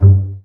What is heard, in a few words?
BA PIZZ G1, Orchestra; Best Service; Peter Siedlaczek's Advanced Orchestra